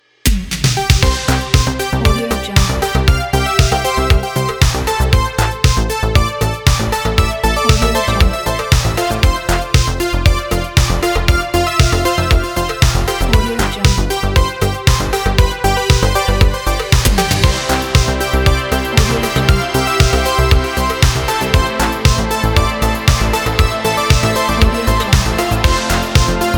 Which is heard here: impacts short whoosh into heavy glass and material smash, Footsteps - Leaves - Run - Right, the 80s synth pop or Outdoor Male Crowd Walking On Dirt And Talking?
the 80s synth pop